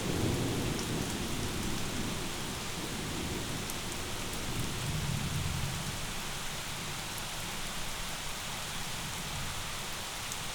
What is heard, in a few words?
Jungle Rain Thunder; Side Surround L-R